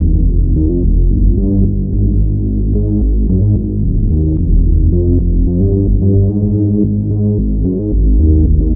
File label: Progressive Synth, Trance